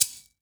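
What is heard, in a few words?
SHAKER, drum machine; JD DRUMS KIT; EdgeSounds; Drum Mashines VOL-1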